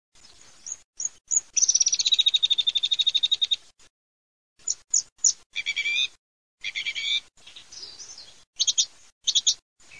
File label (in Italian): TORDO